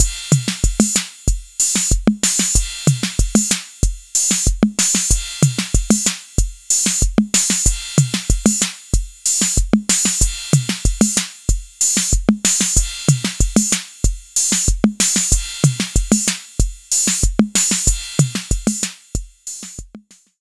Beat; D16 Group Audio Software Nithonat 2

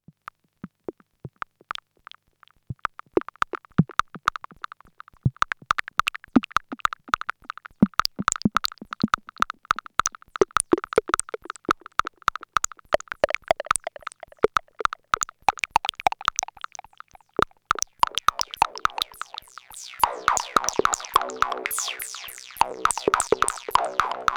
Intellijel Cascadia Filter Pings

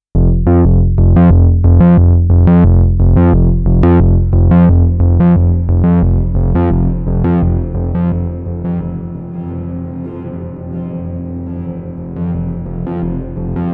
Spring Reverb; Grandmother

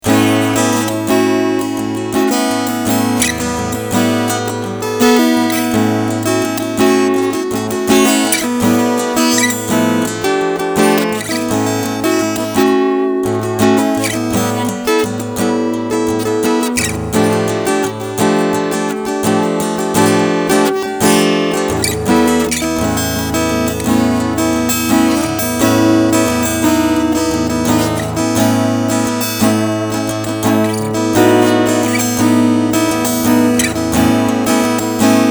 Strumming Piezo

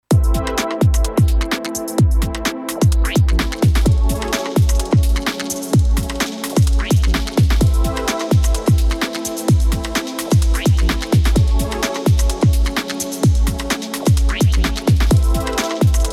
Master Isolator Hi Send Echo Verb

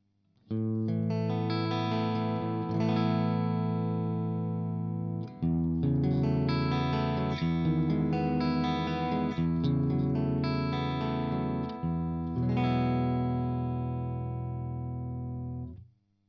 clean Position 3 C414

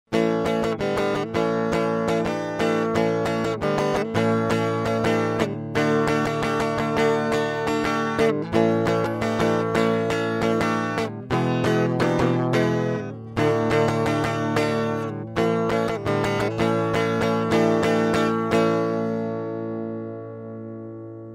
Metal Guitar; Bus Exciter 104

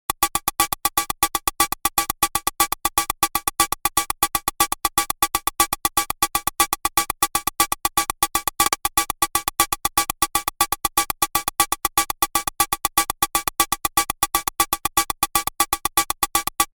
Kobol Sixosix Hat Noise

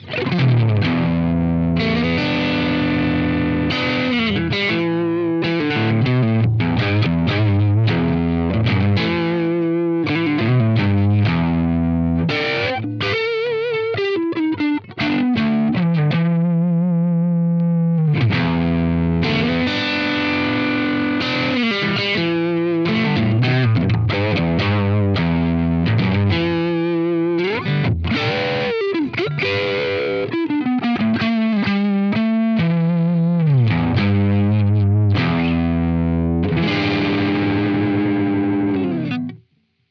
Blackstar Polar 2 Crunch Enhance Modus

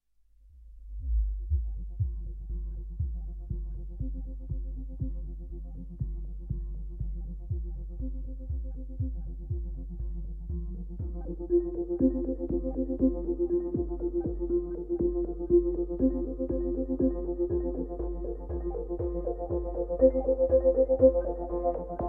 Beetlecrab Tempera Sequence; Gamma

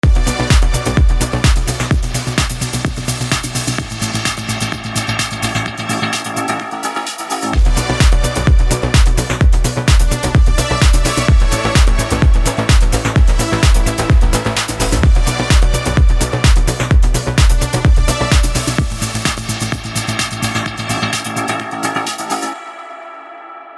PD Magna String Spectral; Vengeance Sound Avenger 2; Melodic Tech Voices 1

